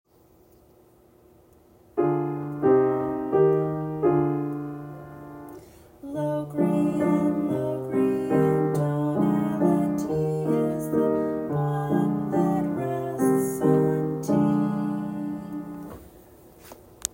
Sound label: Locrian tonality